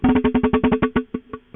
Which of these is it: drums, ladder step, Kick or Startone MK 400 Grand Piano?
drums